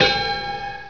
PICK

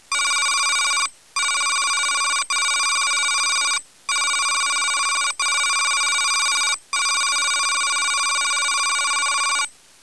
TERMINAL

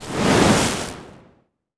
FIRE

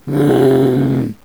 idle, zombie

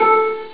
SONAR

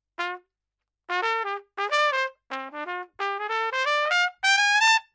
fast

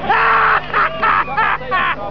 HAHA

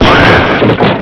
CRASH

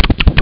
CHOMP

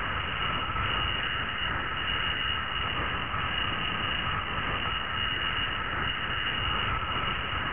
JET

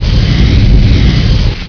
flames

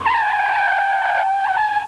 SKID

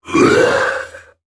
death, shalrath

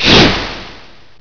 FIRE

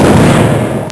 EXPL; TERRA

